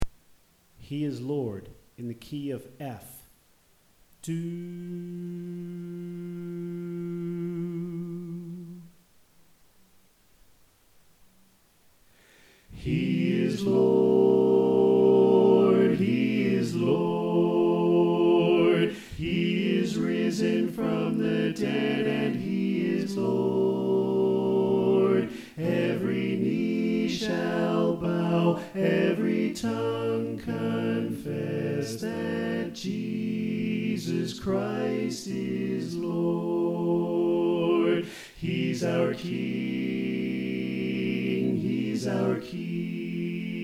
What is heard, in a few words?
Soprano Left